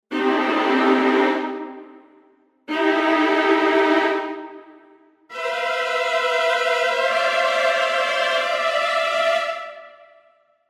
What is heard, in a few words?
String Ensemble Violas Trills